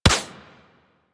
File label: Weapon Punch Bow Common One; Warframe; Weapon Punch Layers